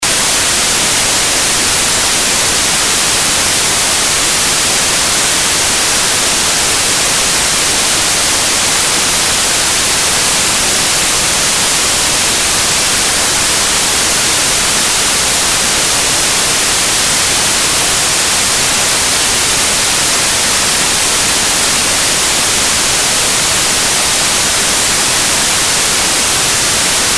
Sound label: white Noise